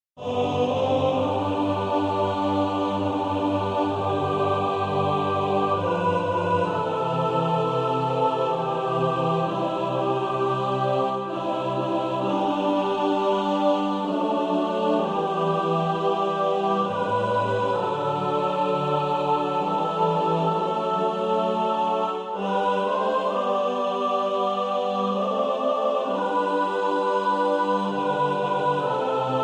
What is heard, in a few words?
mixed